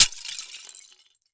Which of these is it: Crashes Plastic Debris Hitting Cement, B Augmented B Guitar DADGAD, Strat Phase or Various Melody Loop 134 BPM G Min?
Crashes Plastic Debris Hitting Cement